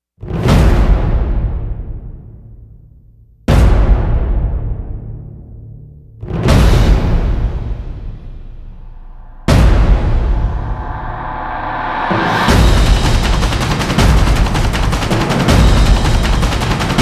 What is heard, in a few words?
drums